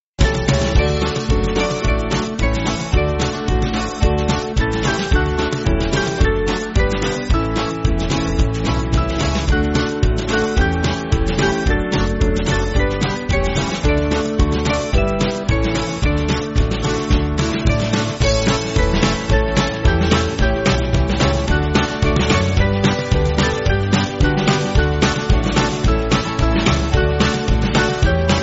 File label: Band, Free Music Backing Files for Churches; Small Band; Throw Your Fear Off; Ratany